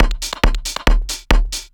drum 138, Techno, drum-loops

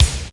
KICK, DRUMS; Crypton - DrumBase5000; T1 DRUMS

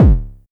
909-ish Kicks C#1, Tuned Kicks; LIMAT Div Dept; Drums

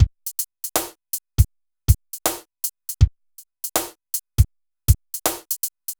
Synth Loop, BPM 80

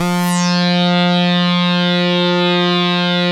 saw res dry, synth; Keith Emerson Signature Series

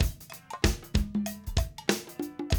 BREAK; Zero G; Man Machine

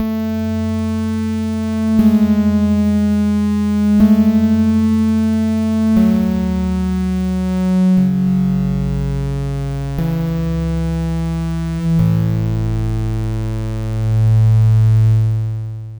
Pad, Chillout; Sonic Foundry (Sony Creative Software); Afterhours EDM; Chillout Service

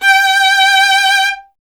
STR VLN, Vln Detache; Miroslav Vitous

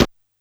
C6 Machine Gun One Shot, SFX Kit

